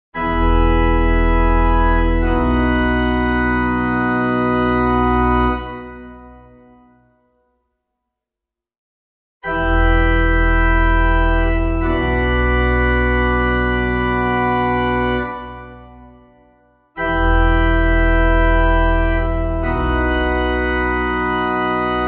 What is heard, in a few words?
Amens Simple B Pipe